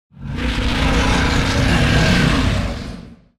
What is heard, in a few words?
dark monster breath